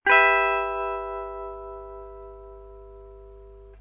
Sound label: Guitar Strum; When Bon Bon jumps out of window